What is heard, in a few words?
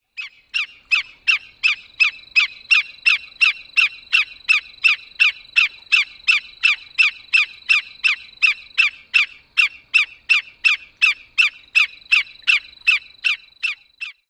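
Black-necked Stilt calls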